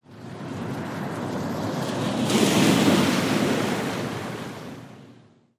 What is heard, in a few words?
driveby puddle, Cars pass by, one with a loud muffler, several through puddles.; Traffic, Ambience Intersection, Street Car Bys